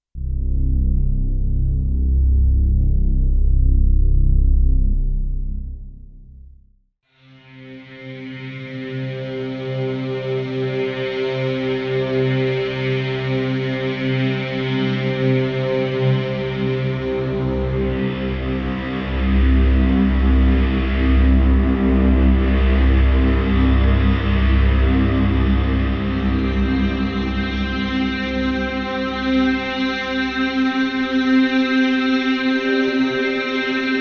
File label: Live Worship Drones